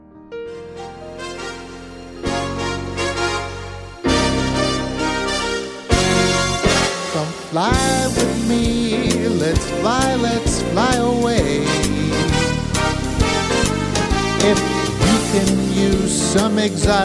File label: Vocal Demo